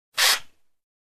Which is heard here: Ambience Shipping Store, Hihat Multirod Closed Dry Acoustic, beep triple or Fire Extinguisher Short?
Fire Extinguisher Short